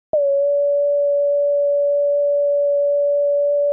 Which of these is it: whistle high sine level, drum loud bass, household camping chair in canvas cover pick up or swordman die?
whistle high sine level